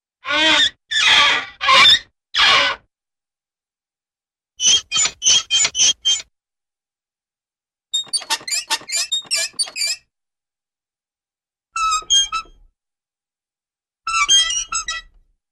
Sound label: Metallic Creaks; Hollywood Edge Cartoon Trax